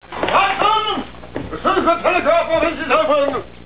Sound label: telegraph